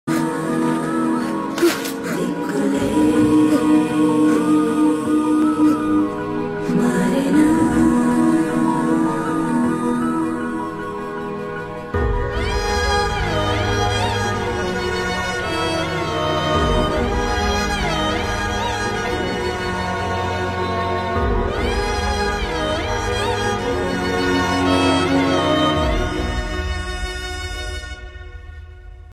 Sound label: sad bgm; dear megha movie